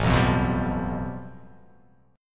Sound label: A 7th Suspended 2nd Sharp 5th